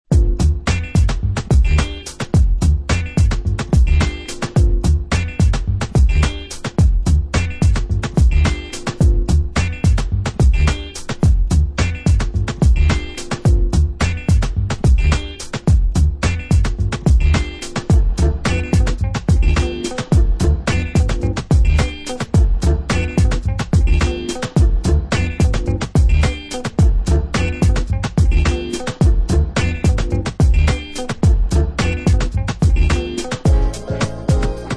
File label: Instrumental